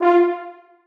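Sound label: horns staccato e4; Sonatina Symphonic Orchestra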